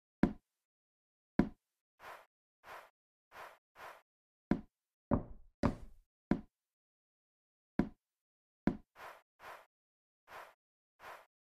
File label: Puzzle